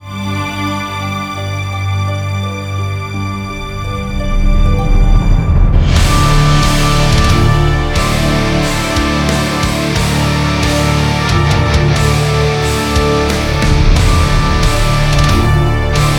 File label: Karaoke